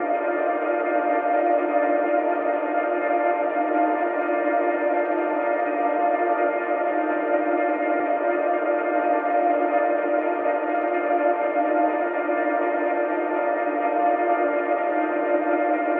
atmo, pad; M8

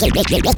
SCRATCH; E-MU Formula 4000 Series Vol. 2; Orbit Presets

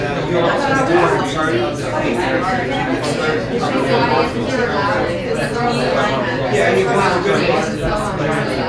MED RESTRN, Back Ground, RESTAURANTS; AKAI S6000 CD-ROM - Volume 6

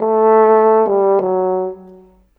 Horn, Orchestral, Rock Pop; Sonic Foundry (Sony Creative Software); Orchestral Series VOL-4 - Rock and Pop

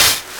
CABASSA, Ragga & Dub Reggae; USB Soundscan vol.27; AKAI; CABASSAS

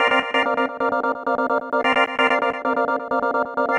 Kaossilator gated (130BPM); musicradar

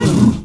MALE WAR, SHOUT; Heart of Africa; Spectrasonics; Heart of Africa 1; CH. SHOUT2

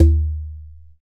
Conga Battery, EMU E-III; e4

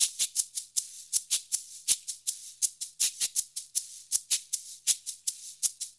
80 MIX, Percussion Loops

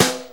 Brush Snare; Sonic Foundry; Loops & Samples Drum Tools; Brushes

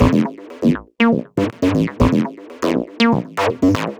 303 loops; Sonic Foundry (Sony Creative Software); Ugly Remnants VOL-2

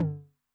High Tune Med Decay, 909, MID TOM; classic machines; 909mod; MT - MID TOM

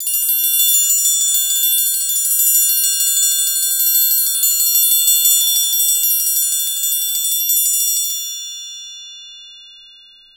TRI TRM HI, orchestra; Best Service; Peter Siedlaczek's Advanced Orchestra

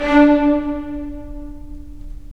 STR Full Short; Roland - LA Composer vol. 4

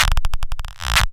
Cranky Clap Modulator; Cwejman S1; DevSnd Cwejman S1 - JK

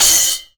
CYM HIT; Sounds Good - Roots Reggae

